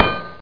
bounce, remington

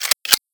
m4a1 silencer off, weapons

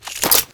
pkm chain, weapons

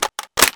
m4a1 clip in, weapons

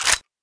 g3sg1 slide b; weapons; svt40

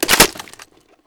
pkm box in, weapons

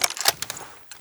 clip out, weapons

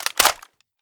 g3sg1 clip out, weapons; svt40

